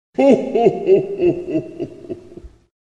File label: Hohohohoho